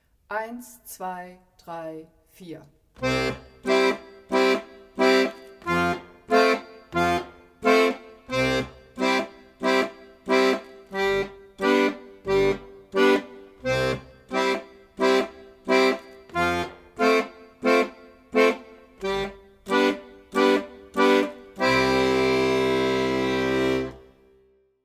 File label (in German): f grundbass und f dur akkord